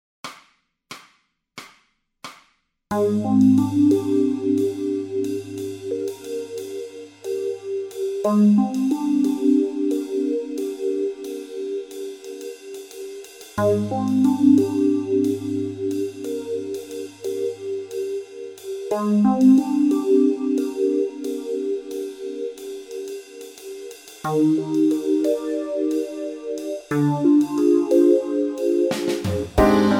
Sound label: bass modes, backing tracks